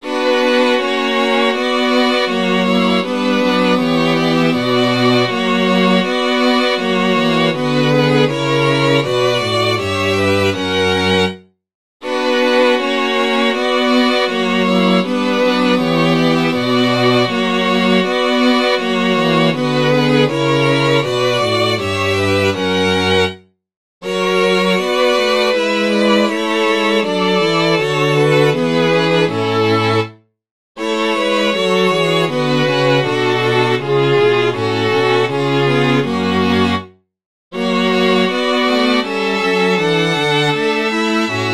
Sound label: chorale for string quartet; bach johann sebastian; ach ich habe schon erblicket